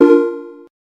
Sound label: electric, keytone